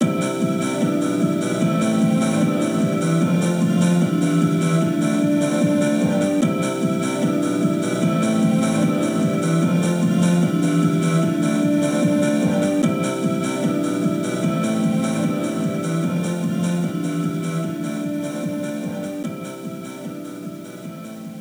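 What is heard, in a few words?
background music xmas; yakuza ryu ga gotoku kiwami 2 pc gamerip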